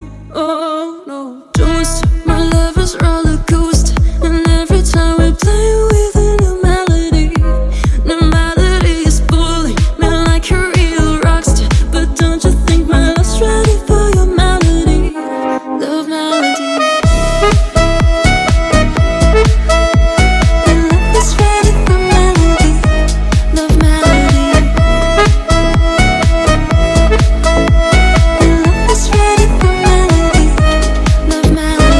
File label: love melody organ; красивые